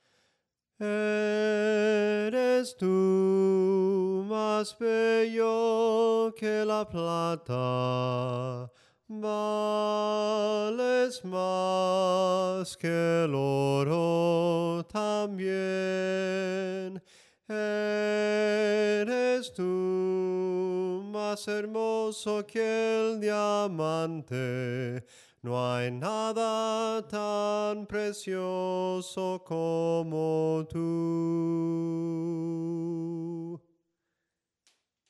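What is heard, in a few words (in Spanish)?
SOPRANO CANTADO, himnos en melodía soprano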